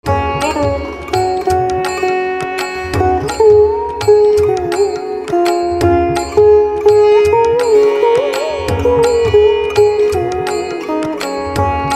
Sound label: Veena